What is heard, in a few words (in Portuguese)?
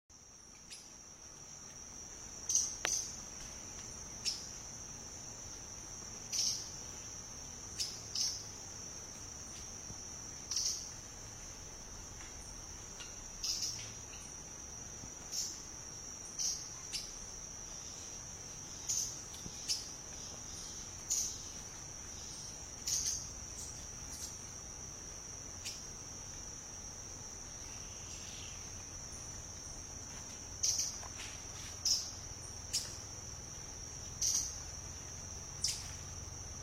Canto da ariramba violacea